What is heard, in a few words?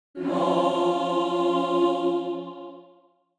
Angelic choir angels video game no